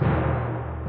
Tymp

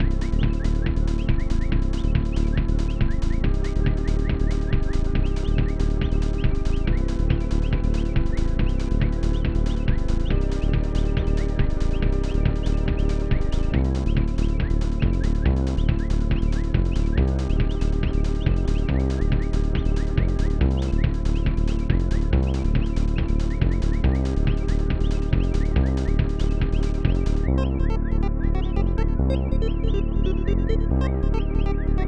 The Forest (Tension ~ Ambience); soldiers of fortune 1993 snes

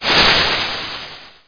Ice Ball Freeze; CastleMiner Z; Xbox 360

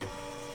Printer Feed; in-game sound effects; Papers Please; android ios windows gamerip 2013

